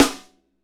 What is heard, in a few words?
SNR FNK, Funk Snares, Roland; Northstar - Drumscapes Roland